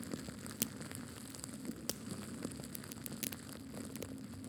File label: fire; Kismet